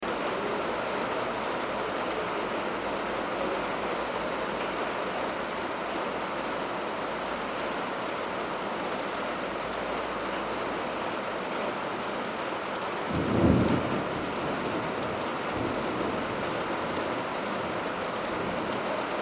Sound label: rain on veranda and thunder